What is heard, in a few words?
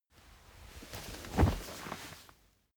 camping chair sit